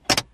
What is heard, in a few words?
side brake